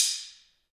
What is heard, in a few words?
Ambient Stix, Afro Wood